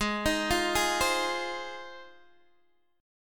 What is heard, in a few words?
Ab 6th Ab, Guitar, DADGAD